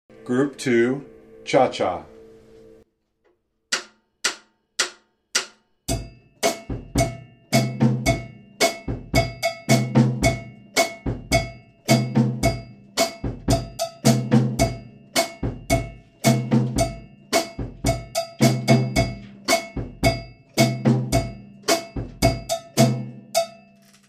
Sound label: chacha